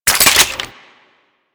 Reload A; Kuva Hek; Weapons; Warframe

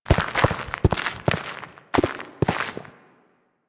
Steps A, Forest Night; Warframe; Sacrifice; Ballas

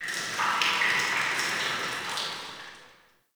Alley Water Drips; Ambience; Dungeons; Under City

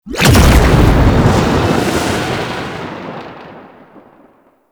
Void Fissure Open; Warframe; Entrati; Conquest

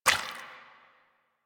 Footstep Default; Warframe; Warframes; Pirate

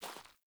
Grunt Boot Walk Gravel; Characters; Enemy; Melee Grunt; Footsteps; Boots; Sinecure

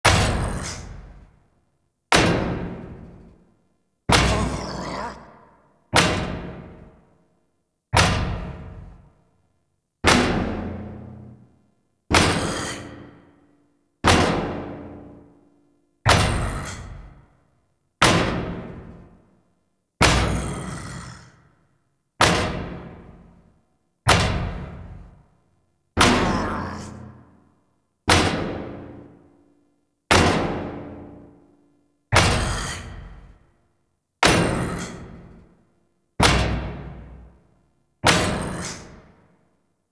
Pipe Thumps; Entrati Labs; Cavia Rank Up Scenes

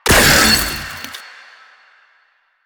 Verglas Prime Shot, weapons; DE; Warframe; Sentinel

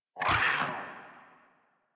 Catbrow Combat Vocal Attack Short; Warframe

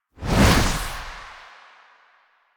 Fuel Projectile Throw; Warframe; Jade Shadows; Ascension

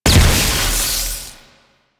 Wave Ability Ice Proj By Explosion; Enemies; Abilities; Warframe; Duviri; Dax Knight

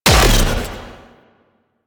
Air Pistol Projectile Explosion; Warframe; Corpus

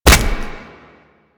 Inf Punch Impact Flesh, Infested; Warframe; Weapons; Inf Kick Punch

